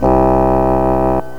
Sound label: bassoon; GMPlay; Reed